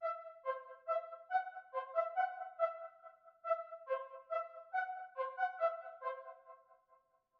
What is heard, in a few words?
mel yellow one BPM140 key E